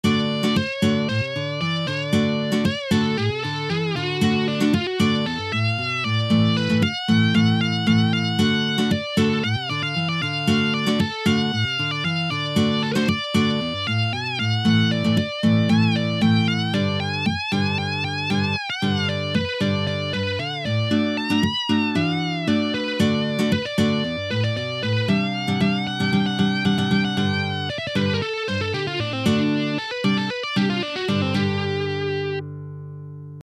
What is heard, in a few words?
Funky Blues